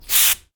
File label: spray; physioex9